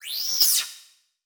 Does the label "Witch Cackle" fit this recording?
No